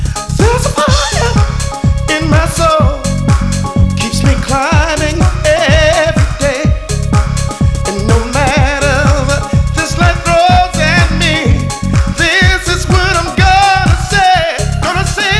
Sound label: Yeah Yeah Yeah